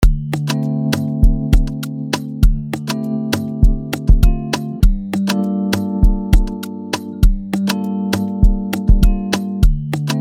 Afrobeat Midi; Solace

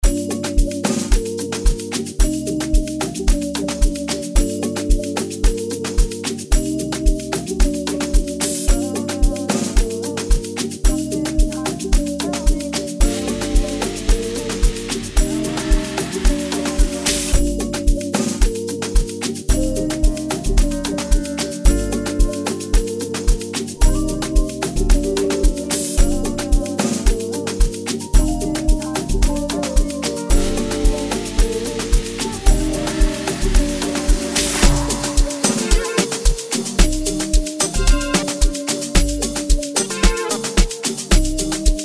Beat 111BPM G minor

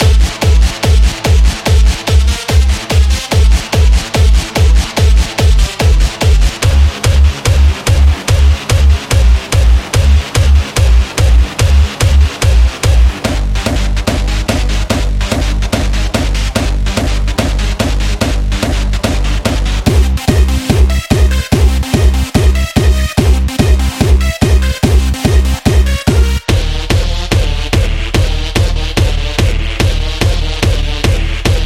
Hardstyle

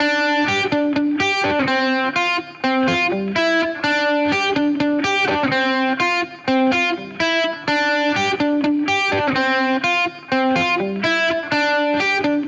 Riff 125bpm Dmin

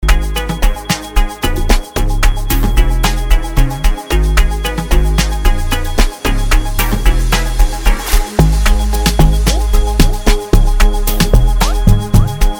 Amapiano; Amapiano Selection

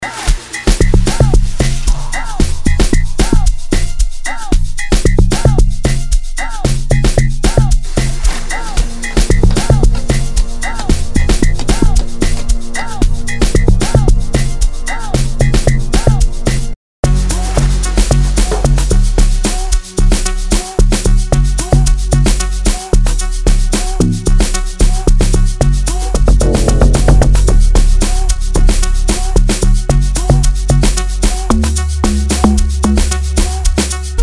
Synth pack; 2025 super amapiano bundle by sliquejams

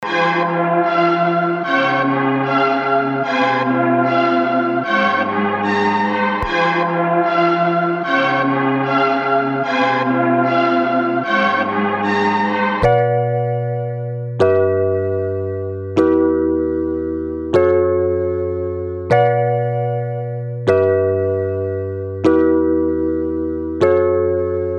Inspiring Chords; Inspiring Chords III

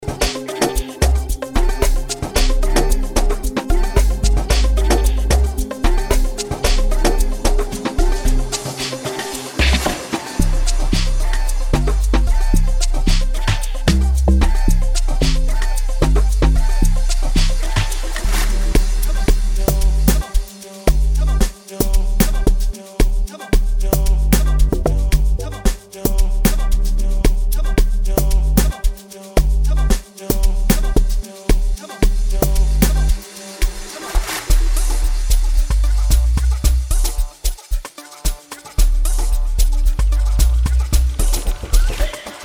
kasi beat, amapiano; 2025 super amapiano bundle by sliquejams

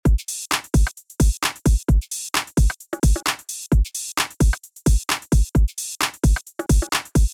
futura loop

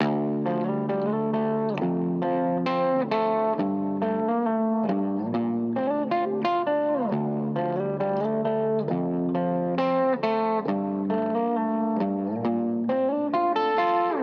135BPM Dmin WET emotional triumph; JESSYCOVETS

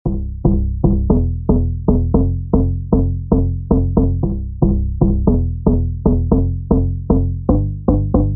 thump factory loop

